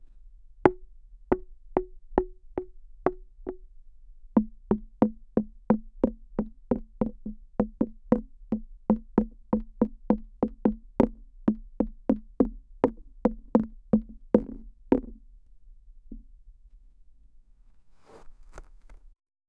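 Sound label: a toy ball bouncing slowly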